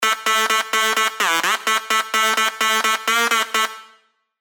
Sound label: Sylenth1 dirty dutch leads; Shut It Down; revealed sylenth1 dirty dutch leads vol 1